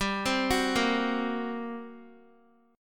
G Minor Major 7th Double Flat 5th, G, down, Guitar, 4F 4A 5C 5G 5C 6E; GmM7bb5; arpeggiated; JGuitar